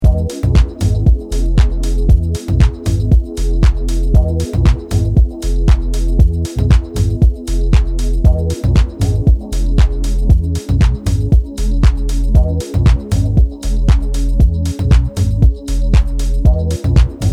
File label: Harmonium